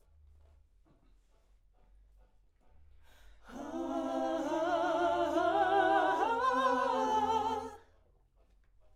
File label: Backs AKG LEFT